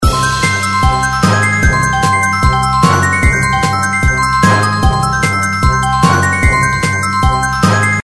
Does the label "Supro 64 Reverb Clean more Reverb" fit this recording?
No